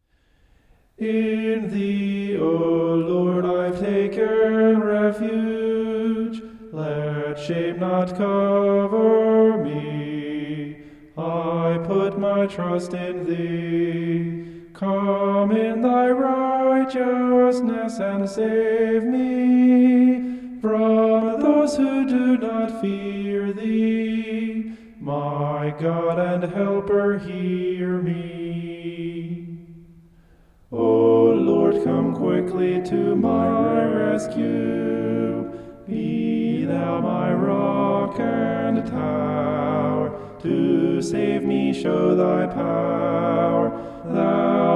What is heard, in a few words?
vocal homoph; psalm 31